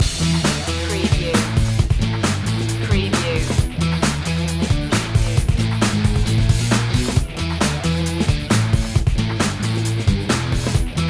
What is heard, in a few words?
burn out loop